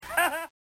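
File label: giggle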